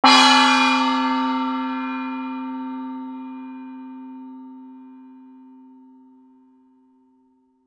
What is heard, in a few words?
PERCUS